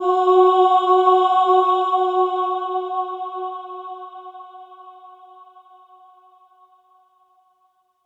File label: Choir F-3